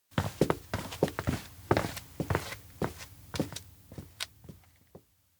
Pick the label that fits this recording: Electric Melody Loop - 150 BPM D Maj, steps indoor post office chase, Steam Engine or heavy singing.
steps indoor post office chase